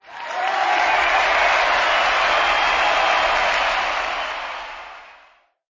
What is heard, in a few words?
crowds cheering